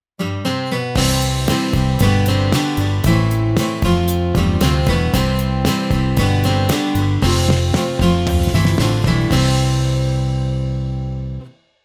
guitar

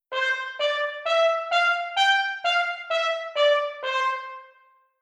stacc

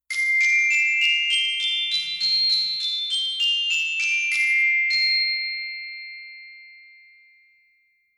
glock

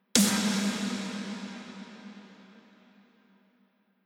Reverb After

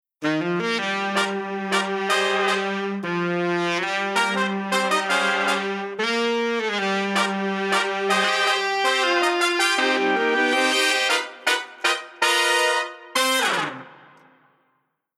Dual Horn Only

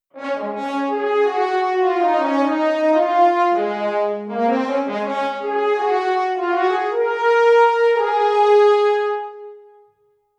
unison

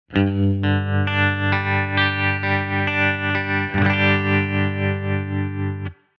guitar Tremolo

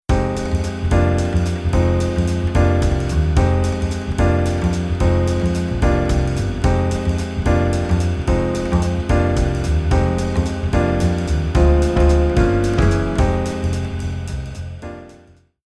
Jazz Waltz Rhythm Kick

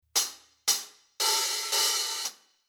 Rhythm Jazz Stick HH

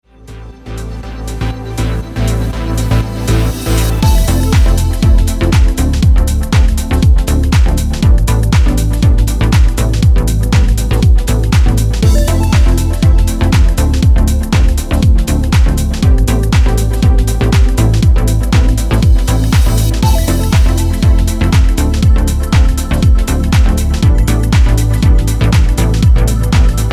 Synth Arr Prog House

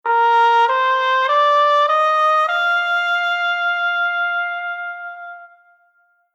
Horn Mute

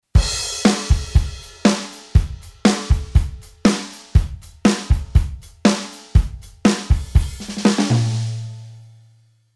Rhythm Kit Pop

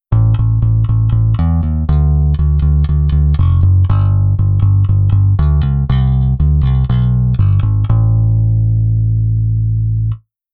Bass Swing 20

